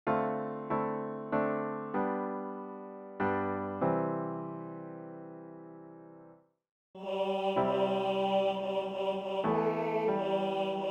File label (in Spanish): bajo; Bajo 1; Cantata para SATB, Solistas y Narración; (Juan 13)